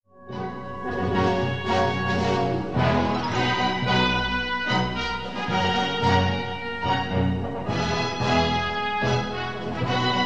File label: heroic music, orchestral